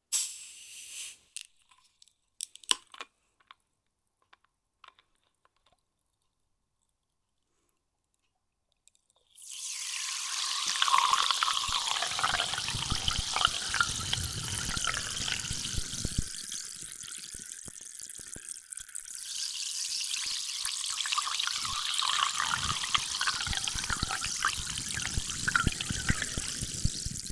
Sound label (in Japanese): 炭酸